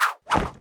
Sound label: disengage; heroes vesper